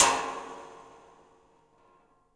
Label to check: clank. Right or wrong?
Right